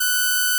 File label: Inharmonicity F#6